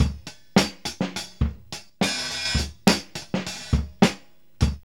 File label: power beat 104; Zero-G; Funky Elements